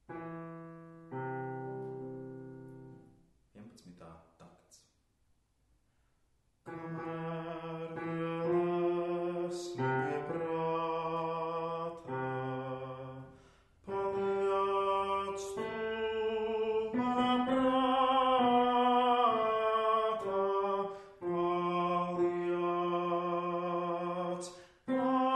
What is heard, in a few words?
bass; Lūgšana; Dziesmu svētki 2013